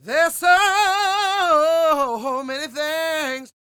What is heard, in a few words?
SING, Vocal Planet Blues Soul BLUESY